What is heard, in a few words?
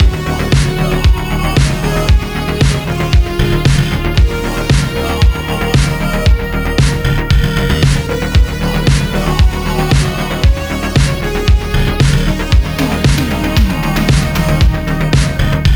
Music Wise The Wise Boss Fight 3 Loopable 115